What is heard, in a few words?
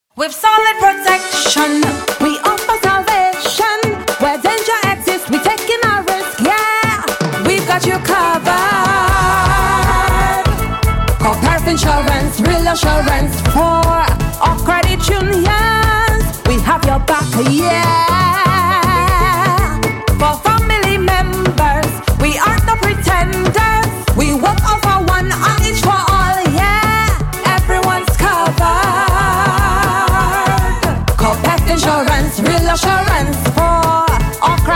Advert Jingle